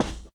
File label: Foot Man Carpet